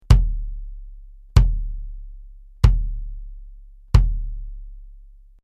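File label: BASS Clr Hard Plastic, bass drum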